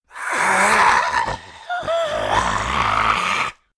Cultist Door

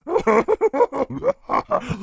King Pig Laugh; Angry Birds Space

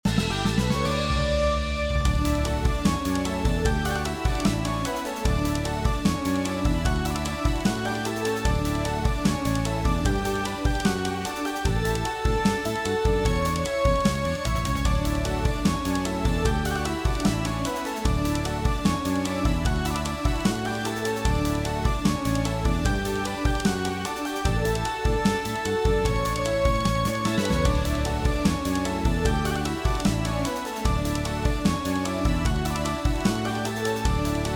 Victory!; Xenoverse Per Aspera Ad Astra; Trainer

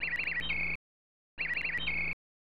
In-Game Ambience; PGA Tour Golf; SNES

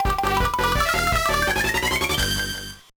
Jingle, gba; Wagamama Fairy Mirumo de Pon Dokidoki Memorial Panic

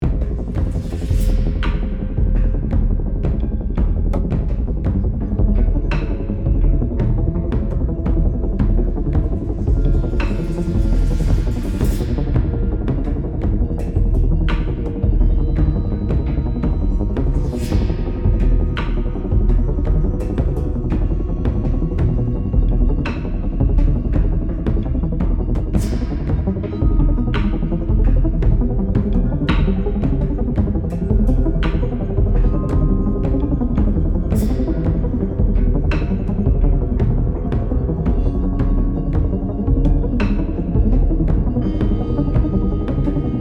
Action loop

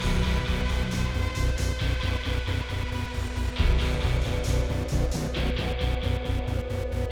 Intro 135bpm; battlefield hardline; ps3 ps4 windows xbox 360 xbox one

